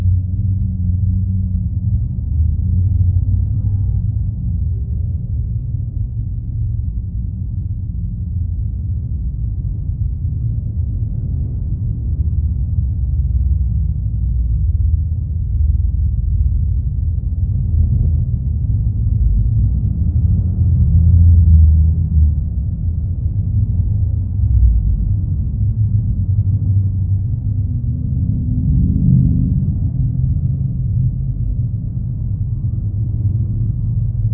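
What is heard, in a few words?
tunnel ambience; grimthane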